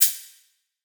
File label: Ride; Cymatics; Dubstep Starter Pack; Drums - One Shots; Cymbals